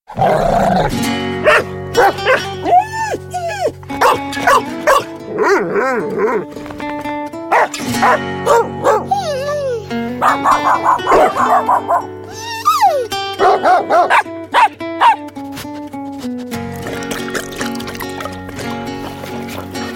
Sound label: Dogs; BOOM Library